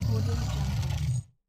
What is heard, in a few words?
large alien cybernetic creature growl; game